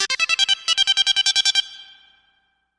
Sonic Mini Fanfare

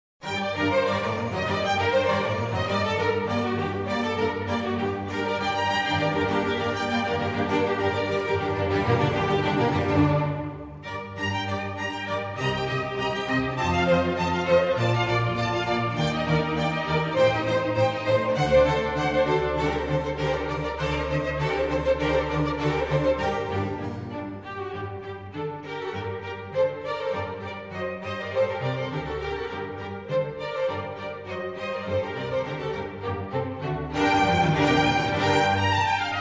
Concerto for Strings in G minor; RV152; Antonio Vivaldi

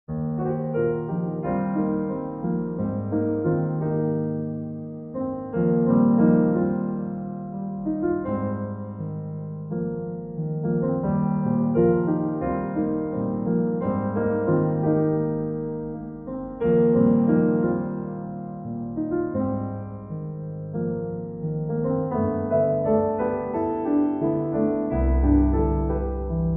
Solo Piano

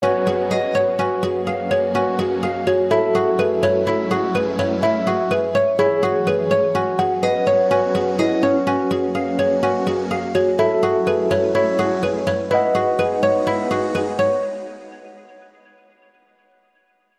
Digital Shuffle

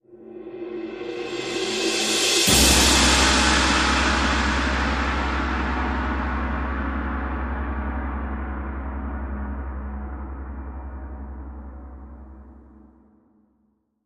Cym Anathema

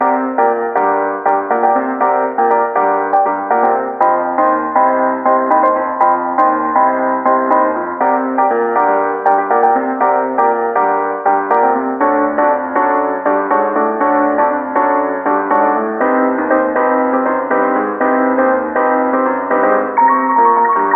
Saloon Piano

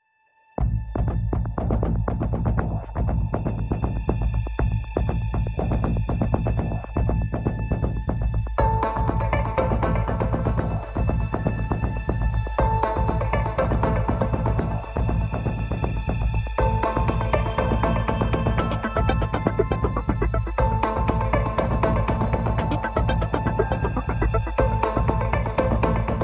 Pads and Pulses Only

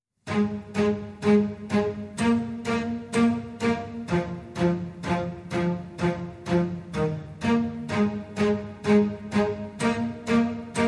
No Violin Melody Mix

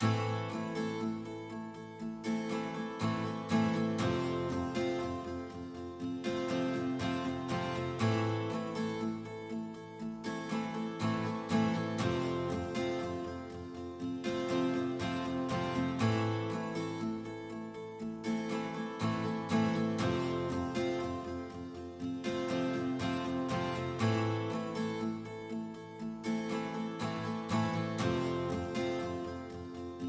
Guitar

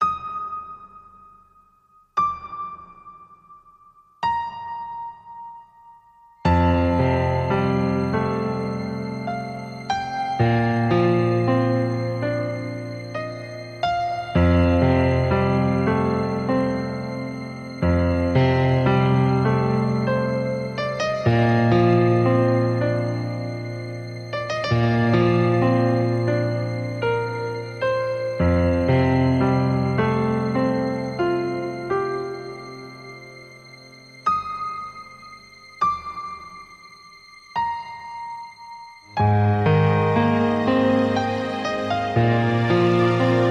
Filled With Regret Piano & Strings

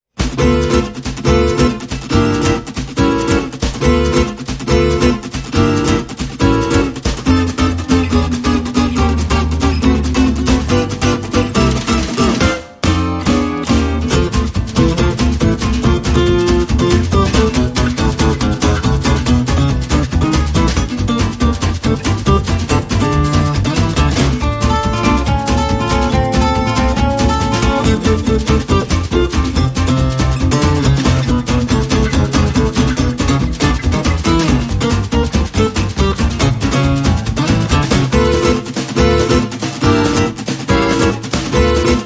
No Crowd No Claps

